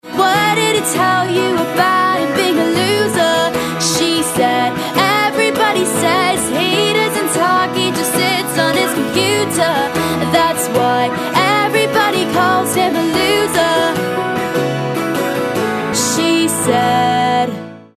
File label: Acoustic Version Mobile Phone Ring Tone